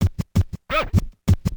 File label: scratch, DJ Booth